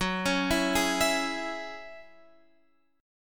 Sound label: F sharp 7th Suspended 2nd, Guitar Open F; x,13,13,11,11,11; arpeggiated